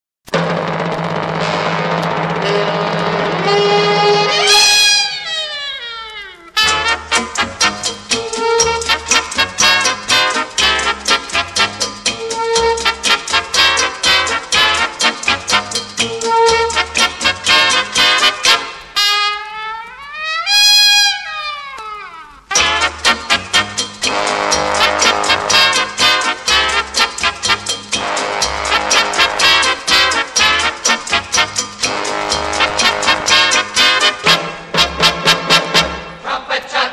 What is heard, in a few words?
TRUMPET CHA CHA CHA